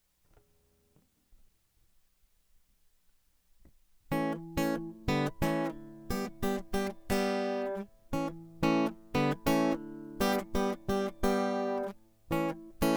Flipping Guitar

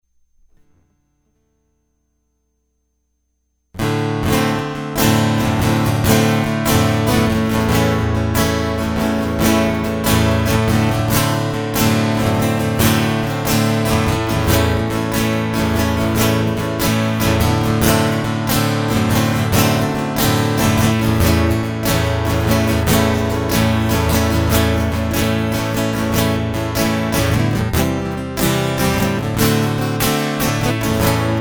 Guitar Loop

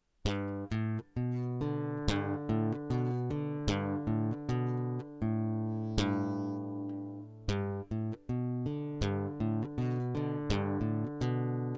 Guitar prelude

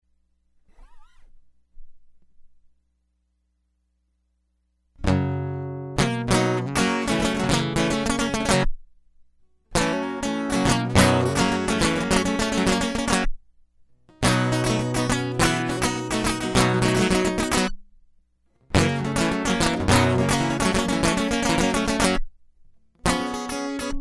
Guitar Loop

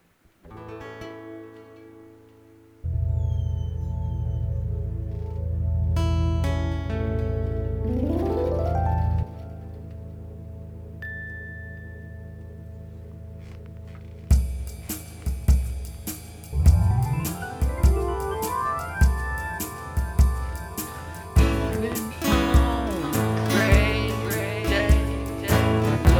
Dog Sings; Moved

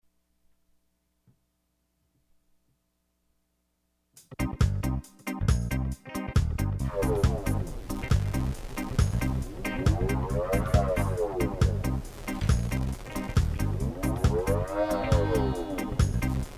Soundcheck

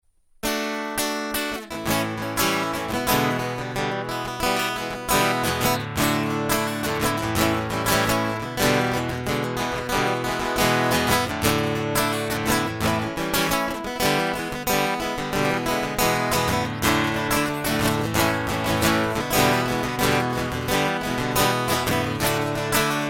Guitar Loop

